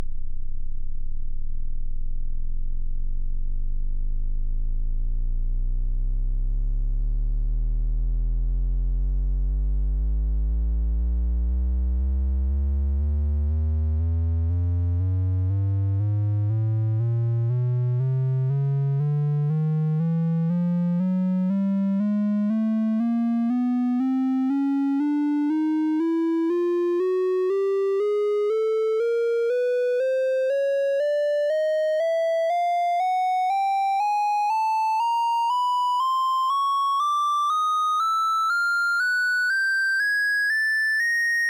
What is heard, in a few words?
triangle